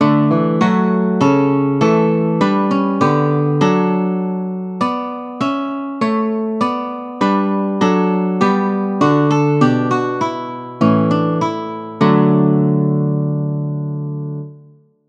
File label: Choral ala Bach - Guitar